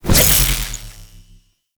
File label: Fishing Spear Throw; Weapons; Warframe; Venus Fishing Spear Throw B